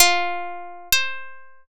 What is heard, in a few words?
sitar